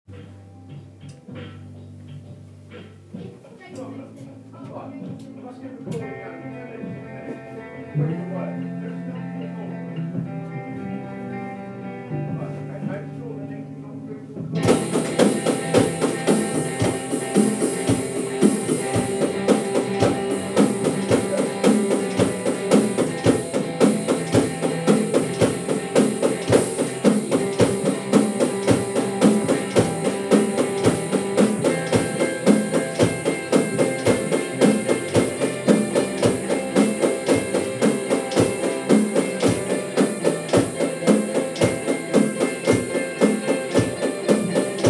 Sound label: rock beat